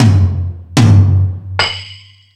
Stadium Rox, Drum Machines

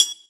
Triangle; Equipped Music; Loungin House

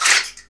mouth, mouth klacks; Roland LCDP14 Africa VOL-2; Foot & Mouth

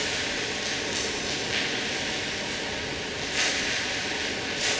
Cracklature, sparse soundscape

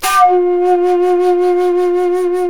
SHAKUHACHI; World Combo

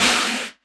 Elektron Monomachine, Drum Machines

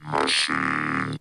machine; Zero G; Man Machine

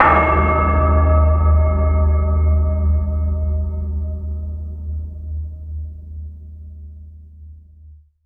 prc ds1, MIRAGE Bell + Flute; ProSamples vol.55 - Retro Sampler

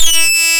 FIX SYNC, ARP Synths; Analog Odyssey; E-MU Formula 4000 Series Vol. 3 – Analog Odyssey

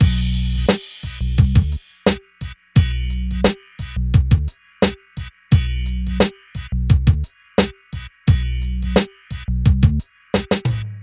MIX, Hip Hop and R&B Drumloops; Best Service ProSamples vol.01; AKAI

